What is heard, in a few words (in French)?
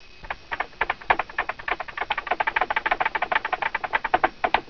fourmi